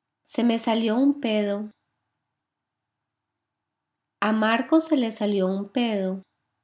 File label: flatulence